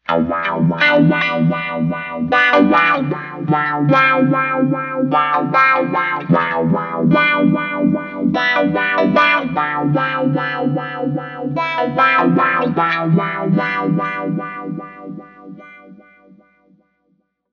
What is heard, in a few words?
Autowah; GFXKILOWAH